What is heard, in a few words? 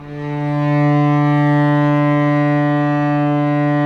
STR, Vcs mf Amb